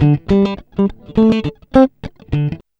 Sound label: 104 FUNKY, Electric & Acoustic Guitar Loops; USB Soundscan vol.04; AKAI